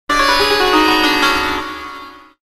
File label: Sitar 3d Sms